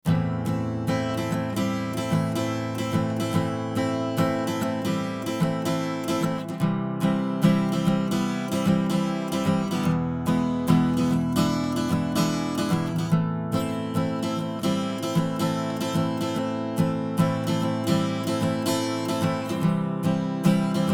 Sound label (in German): Gitarre; Manultec MT-E.8012; ORCA BAY; ohne ORCA BAY